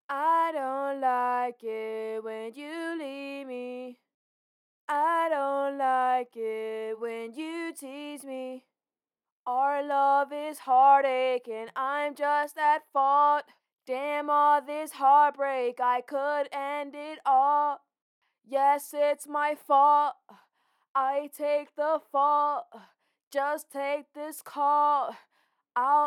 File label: Pop Rock 105 Dm, Acapella; Female; Singing